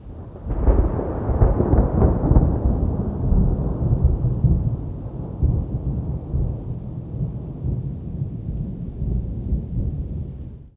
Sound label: thunder distant, ambient weather